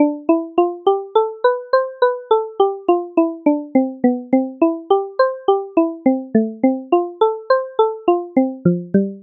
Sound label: piano baroque; open piano